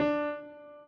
piano

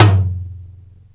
tom high floor; osu

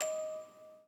musicbox

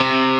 Arp. x x x x 3

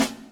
Drum A#

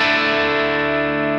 0 3 2 0 Dadd9

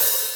Hi-Hat open

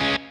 Staccato C#m

